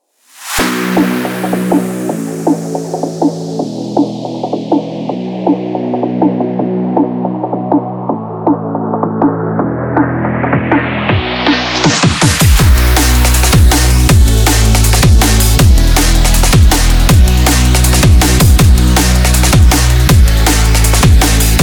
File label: dnb